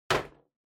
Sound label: Drawing Box Put